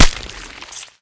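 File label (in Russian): жестокий разрубающий звук мачете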